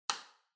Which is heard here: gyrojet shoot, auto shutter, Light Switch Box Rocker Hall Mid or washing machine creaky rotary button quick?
Light Switch Box Rocker Hall Mid